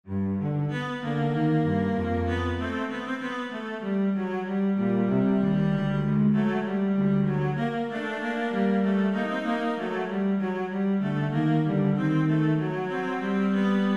minuetto; ferrante andrea; minuetto terzo

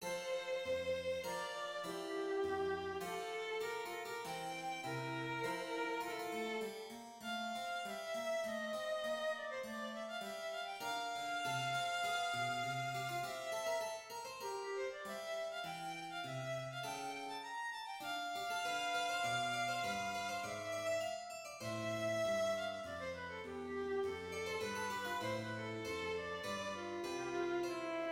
trio sonata trascrizione concerto per violino clavicembalo; Johann Sebastian Bach; bwv 527

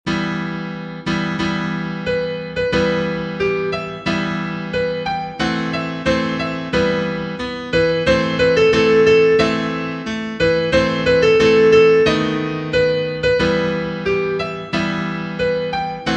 concerto per violino; mendelssohn bartholdy felix

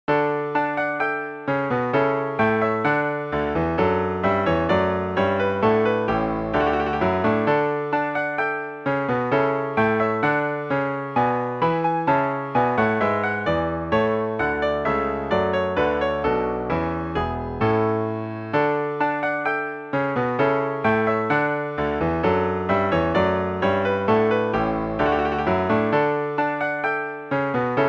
trascrizione per pianoforte; Johann Sebastian Bach; gavotte

